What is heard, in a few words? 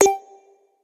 risk choose; Hot Party; Wazdan